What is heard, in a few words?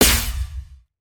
Barrier Shock, ambience spaceship